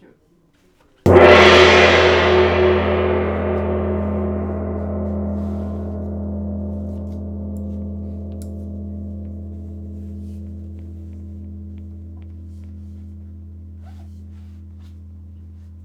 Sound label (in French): Petit, GONG VIBRATION